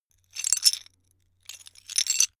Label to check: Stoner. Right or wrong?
Wrong